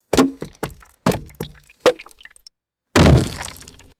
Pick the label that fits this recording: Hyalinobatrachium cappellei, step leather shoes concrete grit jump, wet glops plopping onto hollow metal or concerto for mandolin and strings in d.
wet glops plopping onto hollow metal